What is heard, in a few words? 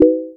chat pling